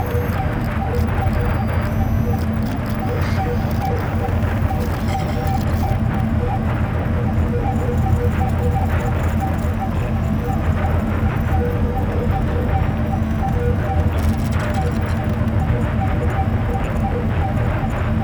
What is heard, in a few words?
Ambient Control Room; Orator